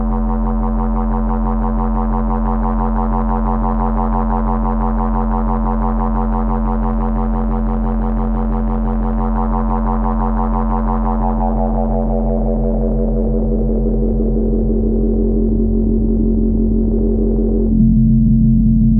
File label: hp to lp; sprott; synth